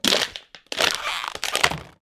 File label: Plastic bottle crush